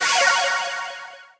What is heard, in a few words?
level up; tetrio